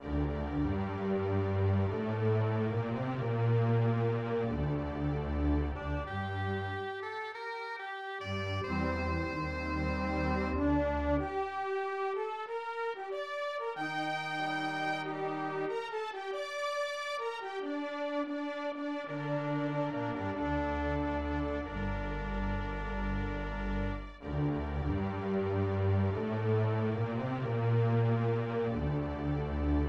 Gameover; super star wars

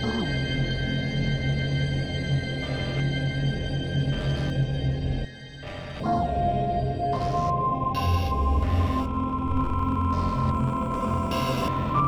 Waterworks Murky Slow; rive wreck hack die retry; ps4

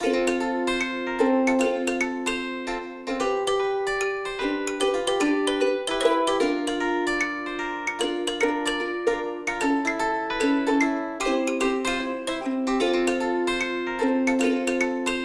Div strings; Toki Tori 2

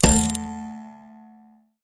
trap level; The Croods

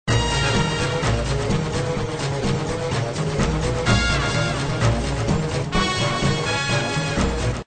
jazz

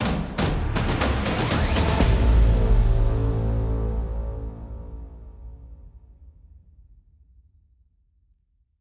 Music Pre Game End; Worms Rumble; PS4 PS5 Switch Windows Xbox One Xbox Series XS

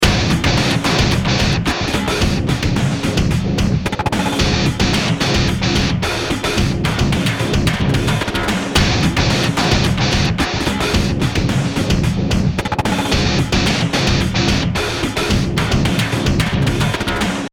Victory; Tank Battle Blitzkrieg

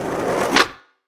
Skateboard Olie Start

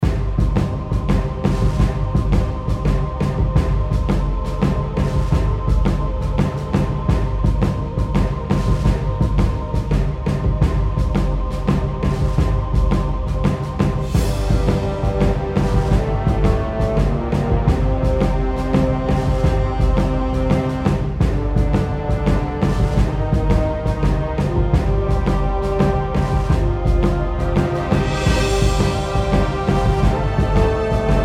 Epic Battle; Music Mission01

